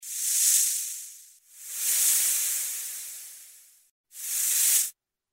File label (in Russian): shypenie zmei